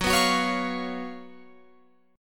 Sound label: Gb Major 7th Suspended 4th Gb Guitar Open F